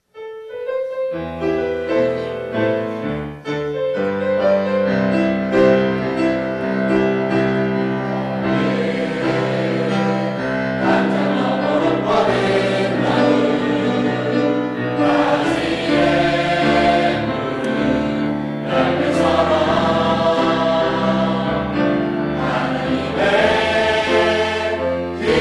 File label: choir